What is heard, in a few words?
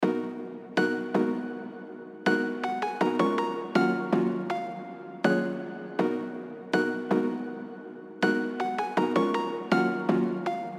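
F minor 161 BPM Plucks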